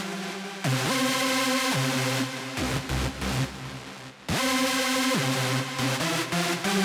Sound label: Pansy Synth; Trap (Трэп)